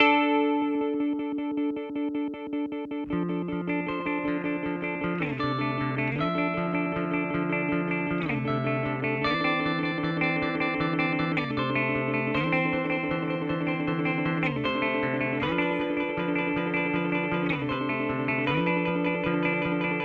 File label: Glitch Delay; Boss DD8